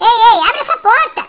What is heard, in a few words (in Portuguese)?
abres a porta, 7invasores